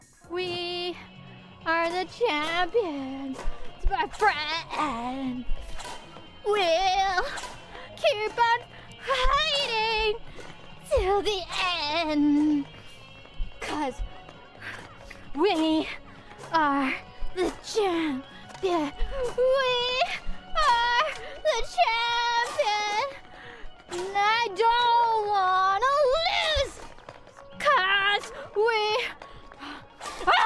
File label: Singing; IRyS